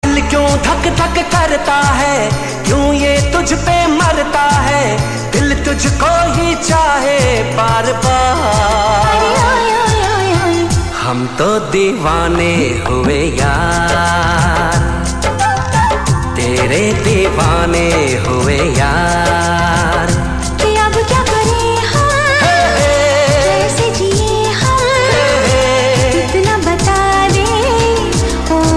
Hindi song

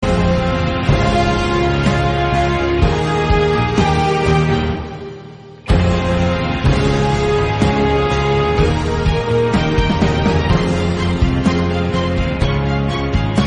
instrumental bgm; Frozen 2

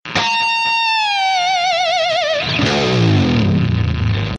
metal guitar

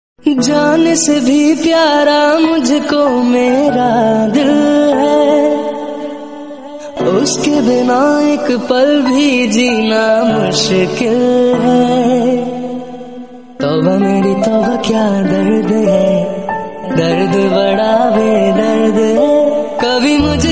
hindi

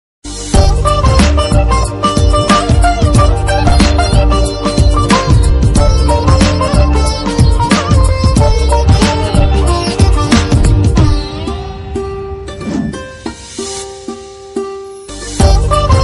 bgm telugu instrumental; rangde; nithin; keerthy suresh

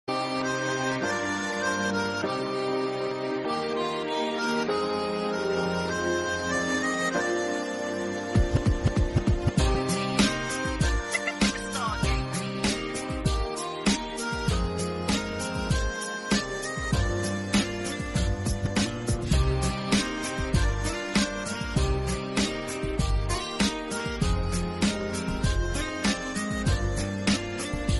bgm, english

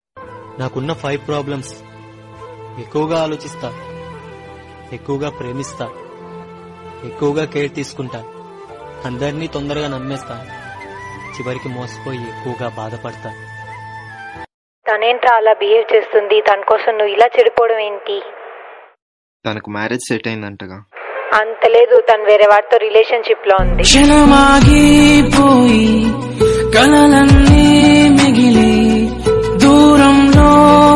break up song telugu

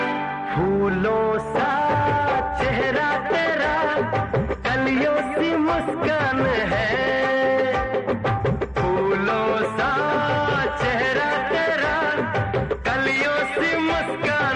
lofi sad love hindi

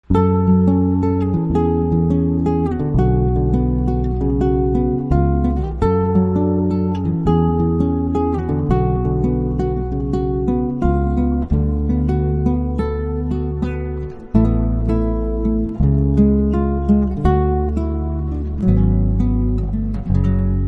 guitar music

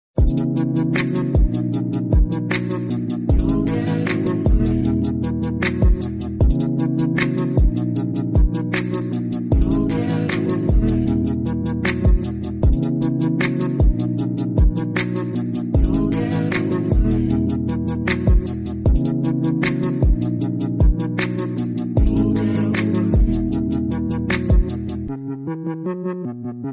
slowed and reverb

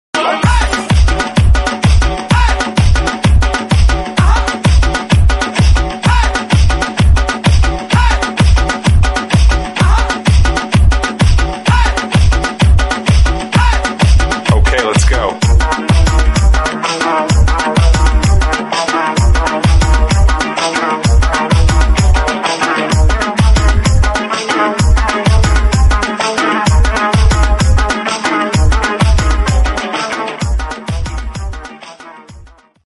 remix bgm

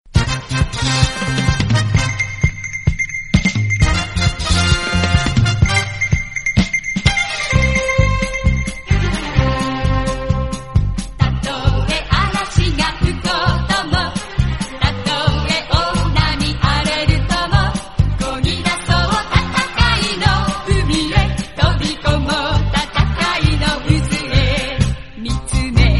english; voltes 5

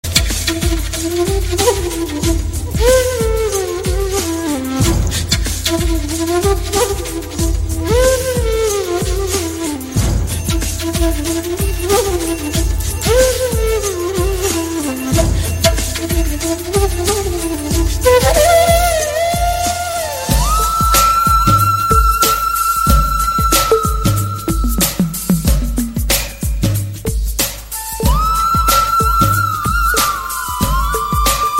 instrumental song

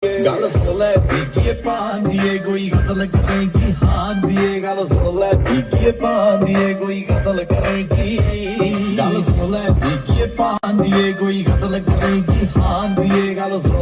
punjabi

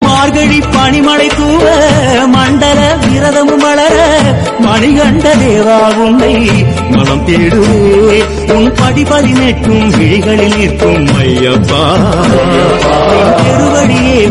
Ayyappa song

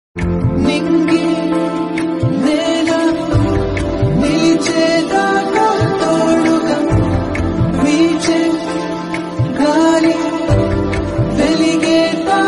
telugu song

